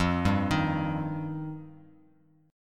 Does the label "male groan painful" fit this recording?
No